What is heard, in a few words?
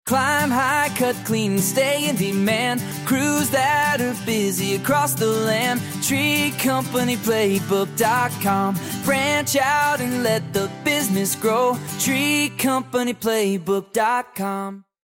jingle